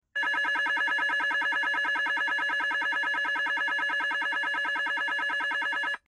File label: UNITROL HERTRO (SUBTOWN); 911 Signal